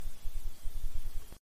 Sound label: snow crunching; Nature